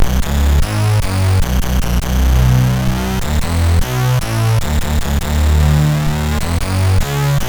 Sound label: Test, MOS6581; hvsc